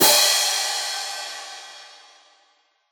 crash cymbal hit

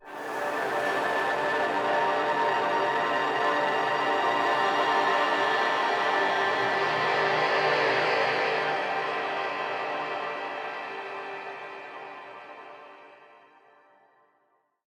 Show Down Longstinger

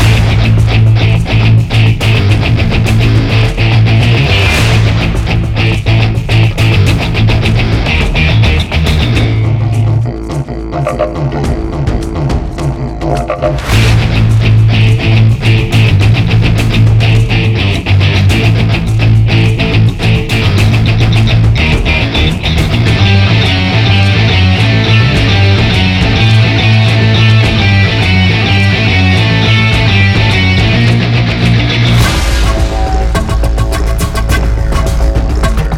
High Combo; Aztec Jungle

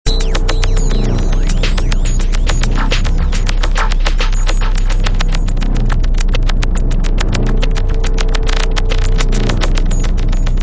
shield ambient

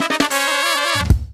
Jingle sad; game builder garage 2021 switch

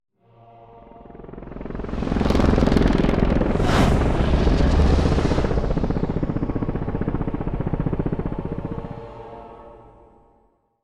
harvest fail; Dead Rising 2 Off the Record

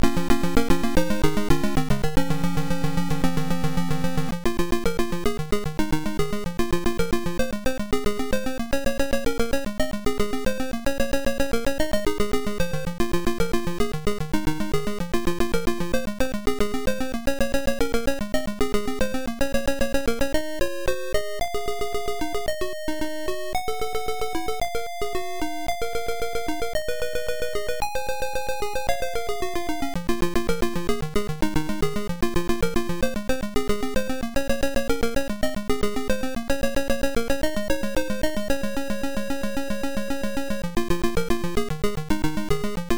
Start BGM ~ BGM

